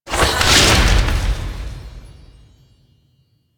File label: Omikuji Charge; Warframe; Koumei